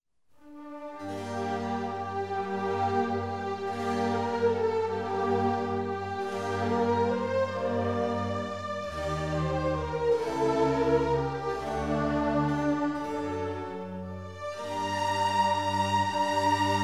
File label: Flute Concerto In F maj; Vivaldi